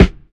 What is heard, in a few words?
LO FI 1 SD, Drums; LO-FI KIT; Dance & Groove; USB Soundscan vol.11; AKAI; 90s sample CD